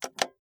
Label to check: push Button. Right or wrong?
Right